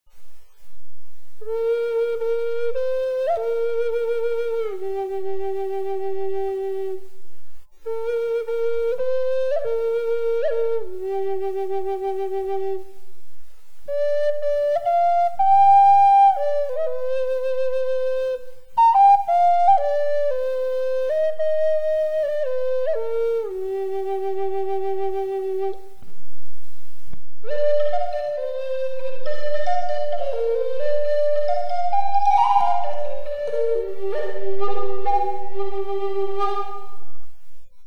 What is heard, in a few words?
trad flute sound